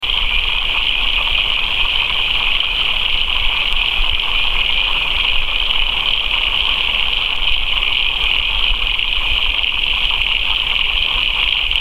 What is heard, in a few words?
frogs; balnagowan